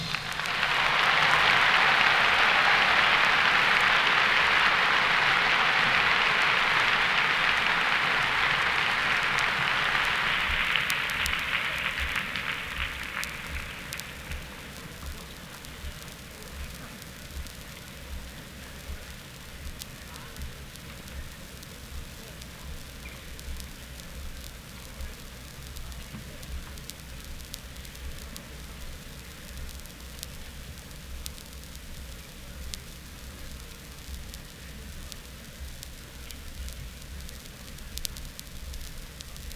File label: Setting Up for Jam Session